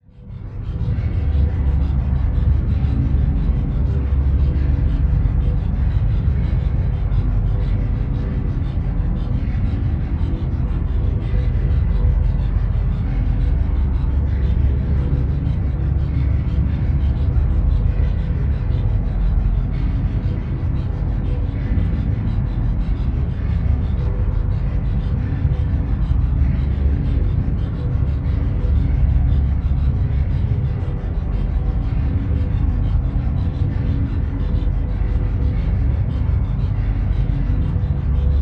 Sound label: Drone Vox Movement Reverb Slow Flange; Production Element Imaging; AdobeCC